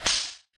m4a1 draw, weapons; rif m4a1

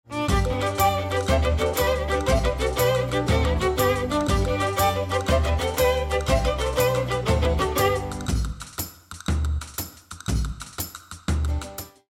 start action; music kits custom

